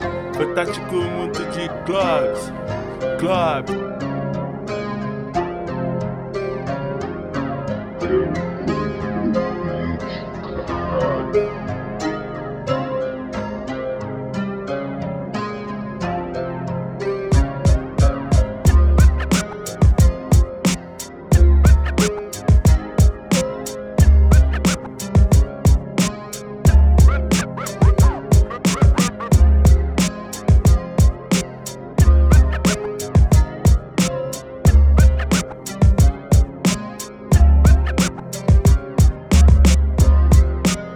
Instrumental Beats instrumental, Base de Rap Underground